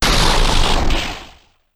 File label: box hit; tf2ware ultimate; mariokart